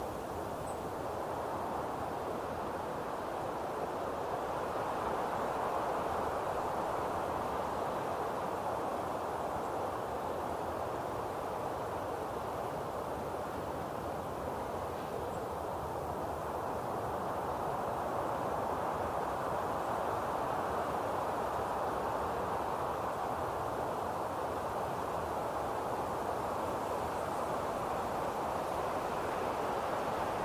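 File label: outside lo loop; The Sims 3; Windows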